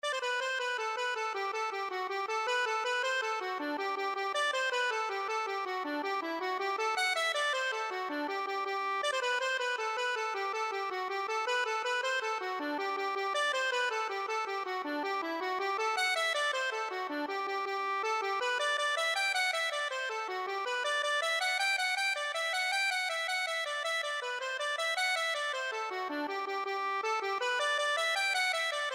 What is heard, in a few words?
jig, accordion; kit mahony